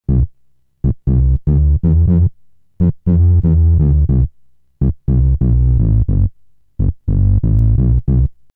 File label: bass analog, HardSID